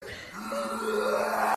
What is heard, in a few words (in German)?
kotzender hund